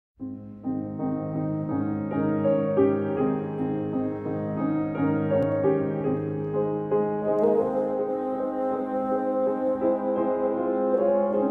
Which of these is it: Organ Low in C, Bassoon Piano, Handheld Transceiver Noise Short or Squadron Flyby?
Bassoon Piano